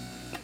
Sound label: Turret Stop; Barotrauma; EK modern